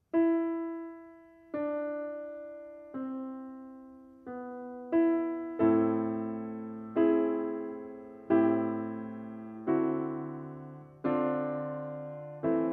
piano; le belier

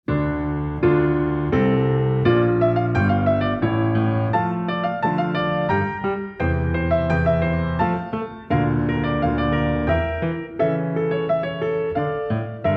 tutti; rondeau purcell

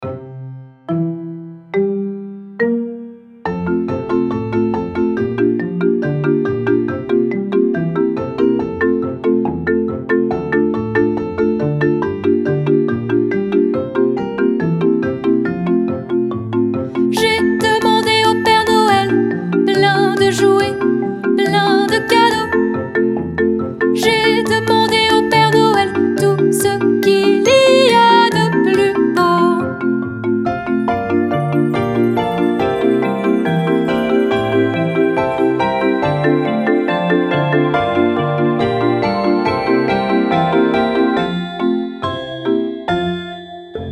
piano voix

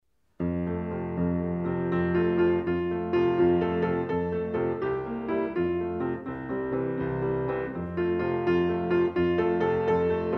piano seul

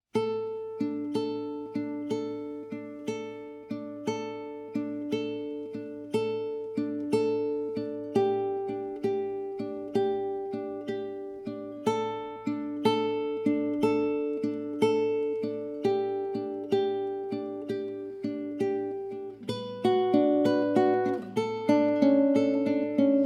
instrumental

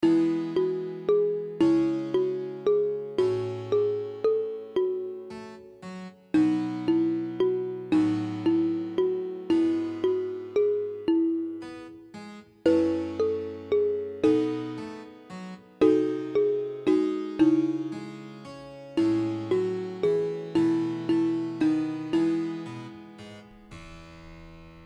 le printemps chant

